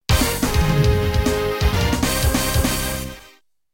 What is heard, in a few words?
Music victory; STREET FIGHTERS